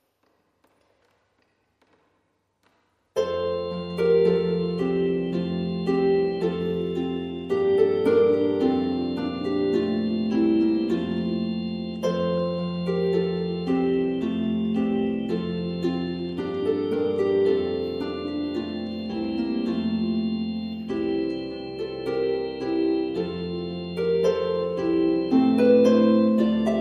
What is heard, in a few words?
Harp Deck The Halls